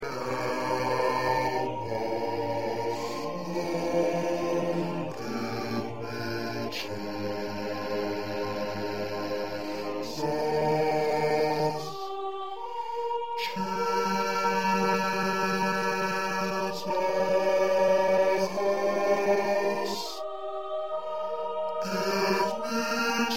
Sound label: 1st Bass